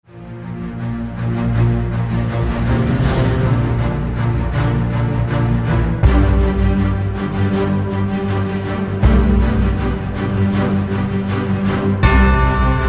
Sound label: String